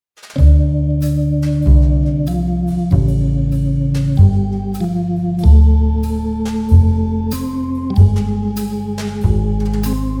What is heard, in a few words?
Plotting Smooth Jazz